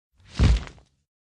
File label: Bird landfall